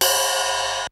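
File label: LINN RIDE, machine cymbals; Hollywood Edge; The Big Bad Beatmachine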